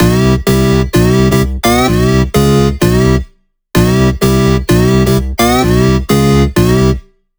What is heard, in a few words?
128BPM Big beat Melody; Vengeance Samples